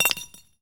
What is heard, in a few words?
BANG, explosions gunshots